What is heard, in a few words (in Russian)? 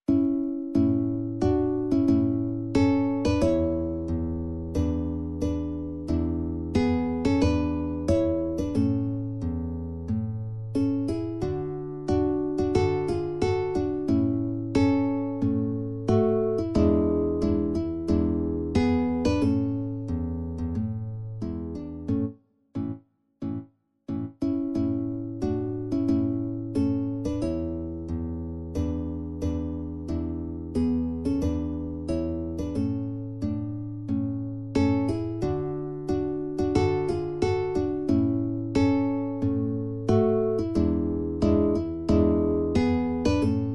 gitara izvestna mne tserkov